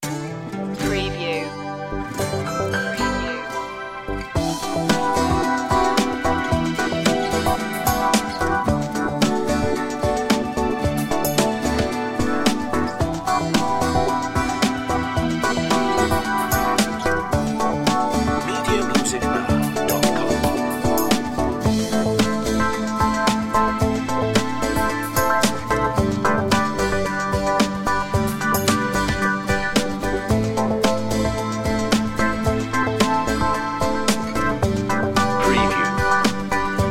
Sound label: acoustica